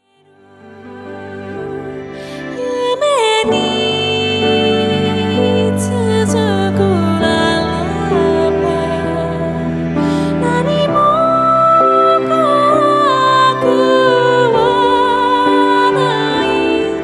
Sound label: Lullaby; ao akua; kessyou